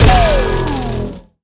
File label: Shockwave; smite; kumbhakarna